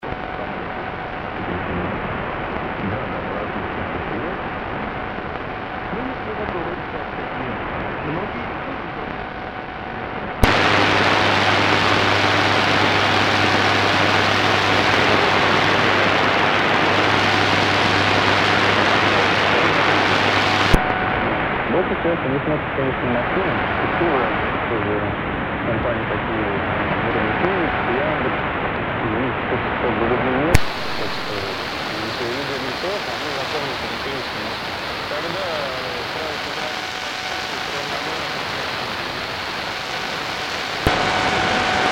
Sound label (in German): Kurzwelle 25m 11845Khz